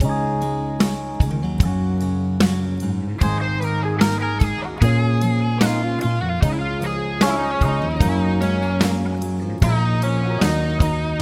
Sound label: Bb Eb Major Scale 75